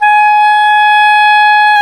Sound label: oboe, Oboe Brth